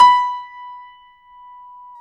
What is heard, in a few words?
PIA GRDPI, PIA_GRAND, Roland